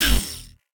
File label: debuff; Smite; god Janus